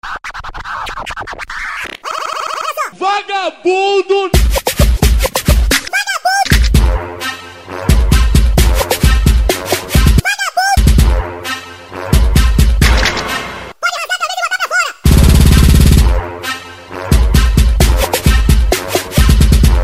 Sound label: funk